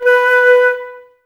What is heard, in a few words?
flute, Wood Winds, Flutes